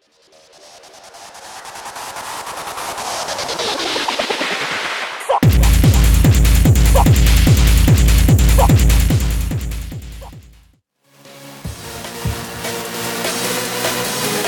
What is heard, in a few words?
HARD TECHNO